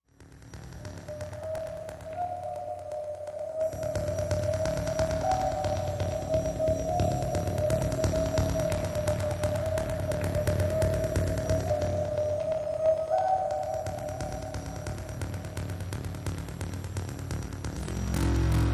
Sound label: Press, Machine, Whistle, Electric Pulse, Random, Ambient, Sparks; scanners; Threat Press, Machine, Whistle, Electric Pulse, Random, Ambient, Sparks